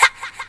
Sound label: vox special